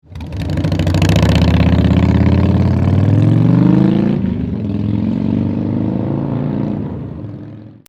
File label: TAKEOFF, Big Shots Long Exhaust For Harley Softail; Vance & Hines Big Shots Long Exhaust For Harley Softail 2012-2017 Chrome